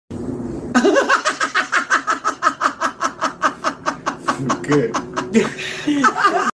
risa

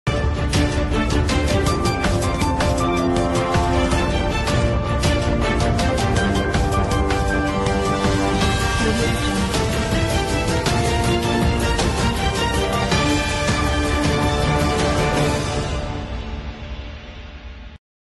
intro noticias

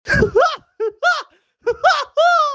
risa larga; peligro sin codificar

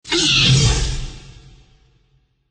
grito

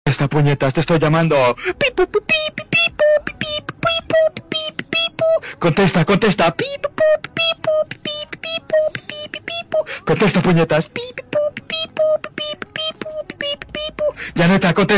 contesta pipupu tono

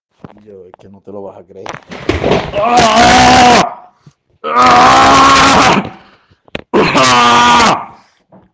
grito

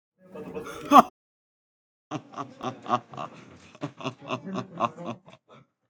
risa millonaria

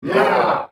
rugido de bestia

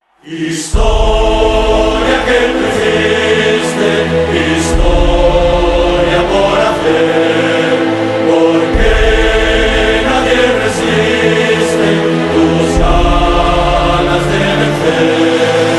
himno Real Madrid

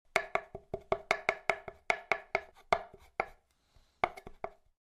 cortando cocina

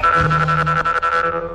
resortes

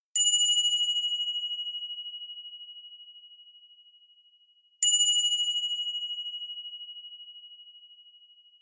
campana; wilo

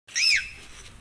chillido rata

